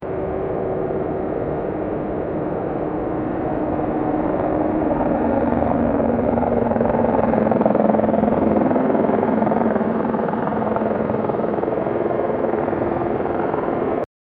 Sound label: helicopter fly by; aerial